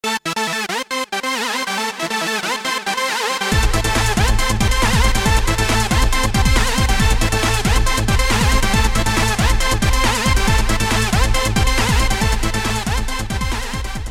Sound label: midibox sid; three oscillators, 3 LFOs, 1 envelope generator & dynamically controlled portamento in action - an interesting brass sound!